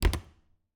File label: Refridgerator, old fashioned, wooden, steel, brass clasps, hinges, close; Eiravaein Works; Vaeyan IV